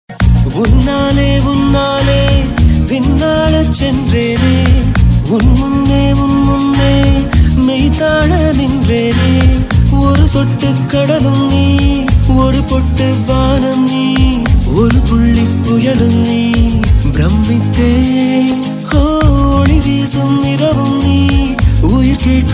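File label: background music ringtone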